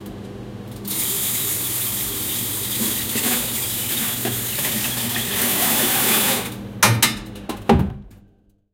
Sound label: washing plates in hotel